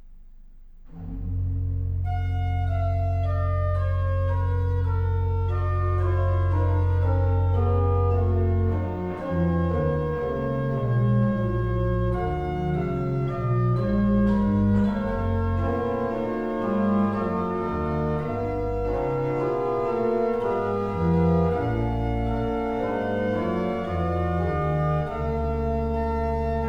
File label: Bach Organ; J. S. Bach; The Bach Organ of Störmthal; William Porter; Christe, du Lamm Gottes, BWV 619